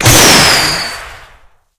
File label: sentry rocket, weapons; tf